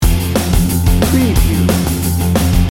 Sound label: pop rock party loop